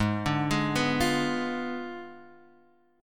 G sharp Diminished 7th G sharp, down, Guitar, Half Step Down